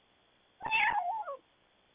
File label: cat meow